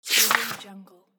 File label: paper slide